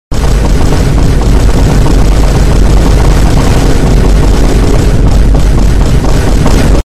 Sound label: boom minigun; vine